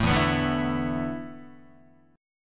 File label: E 7th Suspended 4th, Guitar; E7sus4; strummed